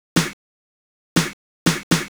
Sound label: snare; hydrogen